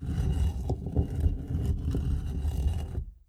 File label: ENEMY PILLAR TURN